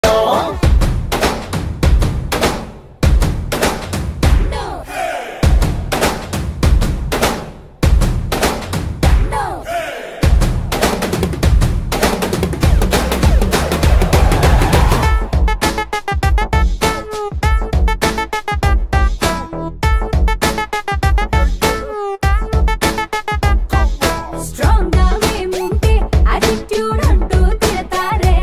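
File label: background music ringtone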